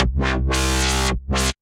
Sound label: Bass; Dressed Up Bass; Ableton; Build and Drop